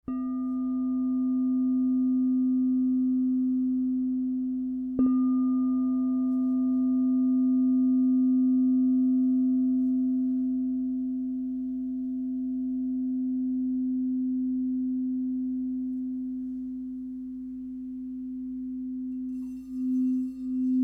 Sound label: Crystal Tones Singing Bowls